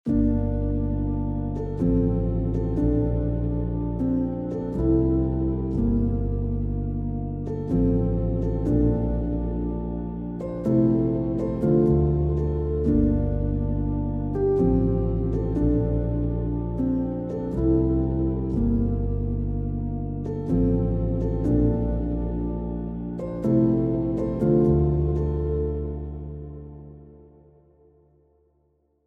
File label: Iceland Piano; Iceland Piano Strings Racks